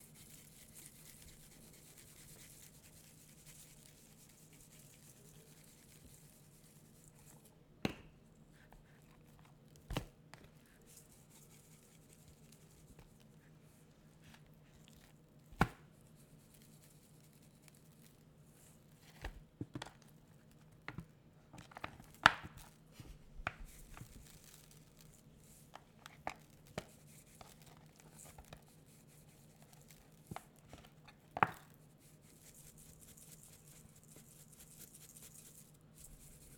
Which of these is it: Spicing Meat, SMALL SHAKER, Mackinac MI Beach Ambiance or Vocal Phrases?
Spicing Meat